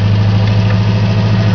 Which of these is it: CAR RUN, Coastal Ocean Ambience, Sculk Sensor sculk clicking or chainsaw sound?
CAR RUN